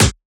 Perc, Percussion; FLPRO

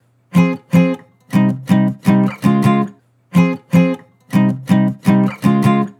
Acoustic Chord Loop 160 BPM G Maj; Cymatics

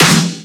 SnareClap; FLPRO

